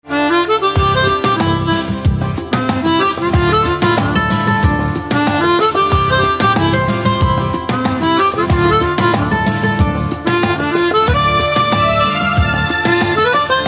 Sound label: Harmonica, instrumental ringtones